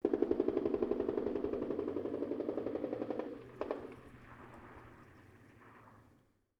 ambient shot mono; War Thunder

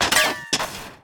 damage hits explosions; World Of Warships

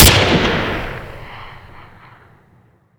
fire rpd; Modern Warfare

